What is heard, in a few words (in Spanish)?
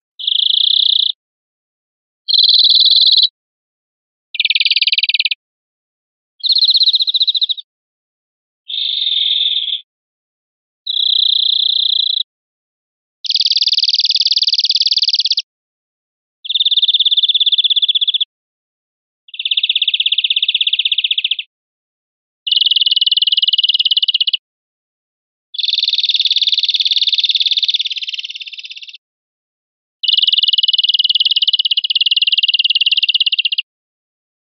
chorro encarrizado